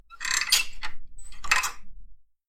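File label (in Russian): записан звук скрипучей дверной ручки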